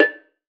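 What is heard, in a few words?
BONANG, Metalophones, Pitched Percussion; E-MU Producer Series Vol. 7 – Old World Instruments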